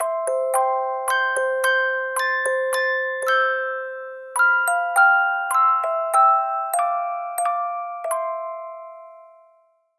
Jingle Sleep

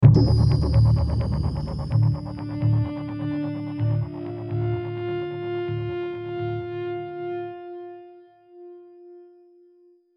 Outro; Humans Single 1 Medium Outro

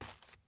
dirt step

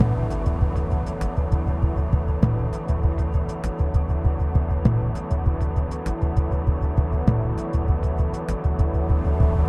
Tension; Mirrors Edge

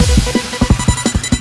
game music normal to fast

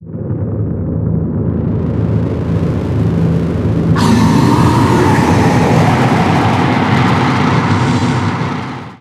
Boss - Transition; Level 1 Boss; Hell Is Other Demons

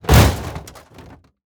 plastic break

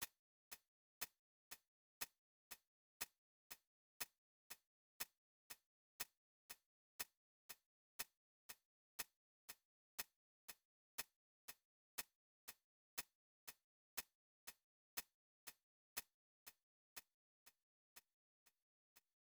Clock; pc-9801

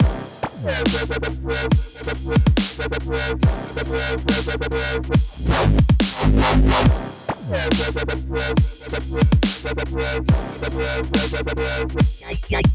Dubstep Intense; Chilltheim

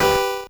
level squish G B; earthnight

